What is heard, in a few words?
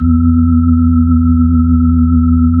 B-3 Chorus